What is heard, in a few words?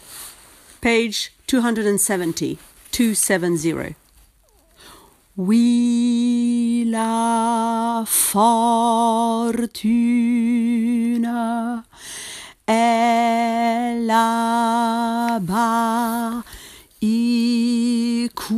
tenor; Carmen